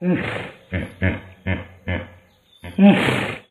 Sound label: Hippo Roar Grunts; hippopotamus